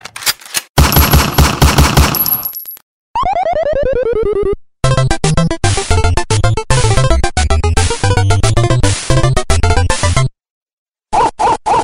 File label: shooter gun, ringetone